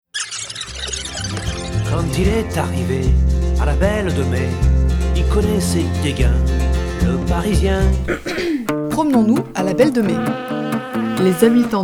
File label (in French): Jingle; Belle de Mai